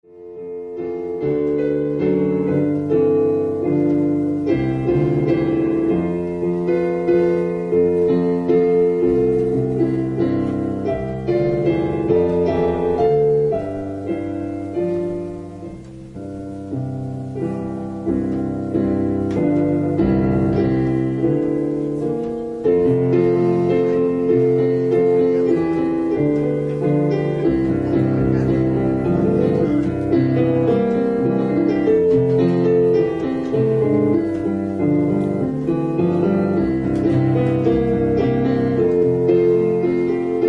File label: Piano prelude